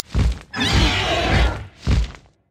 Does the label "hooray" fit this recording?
No